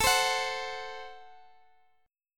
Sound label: A Minor 6th A, Guitar, Standard; Am6 strummed; x,x,x,14,13,14